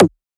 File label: Percussion; FLPRO